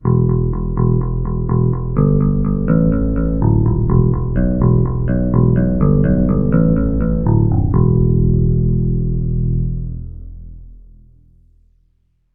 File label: star theme bass